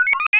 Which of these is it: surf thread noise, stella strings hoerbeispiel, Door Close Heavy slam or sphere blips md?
sphere blips md